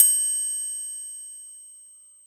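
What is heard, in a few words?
Boss DR-202 percussion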